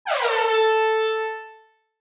Reggae air horn A3

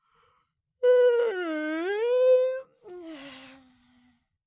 Cartoon little child voice yawn

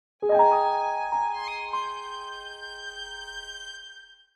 Video game reward chest opening gentle

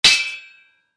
Sword fight metal shield or chain armour hit

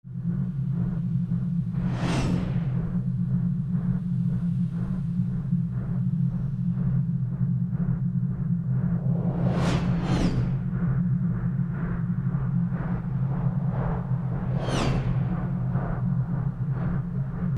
Sci-fi ambience loopable jets military field

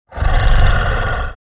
Werewolf deep growling

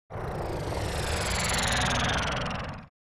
Spaceship pass by alien craft

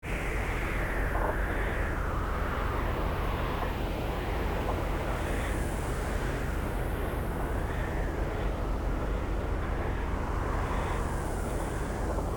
Spaceship ambience loopable exterior

